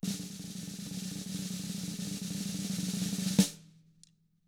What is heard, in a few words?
ROLL, SNARE ROLLS; ILIO; Double Platinum Drums 2